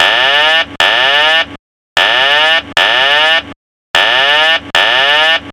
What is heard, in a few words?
alarm